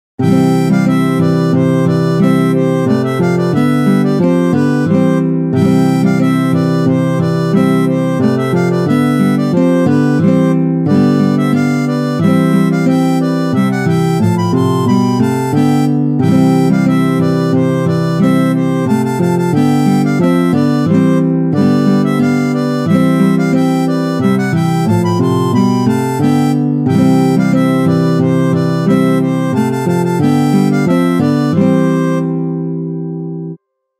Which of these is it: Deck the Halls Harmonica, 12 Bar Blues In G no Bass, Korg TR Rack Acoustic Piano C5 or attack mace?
Deck the Halls Harmonica